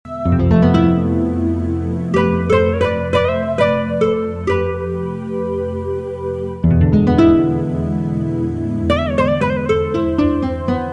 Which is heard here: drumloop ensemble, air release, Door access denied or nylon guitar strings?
nylon guitar strings